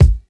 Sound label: warm steel kick drum g sharp key; kick drum samples